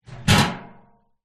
household trash can metal lid close clang

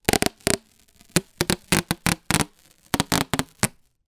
sticky tape rip roll gentle

